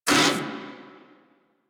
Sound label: Amored Vocal Pain; Warframe; Kubrow; Armored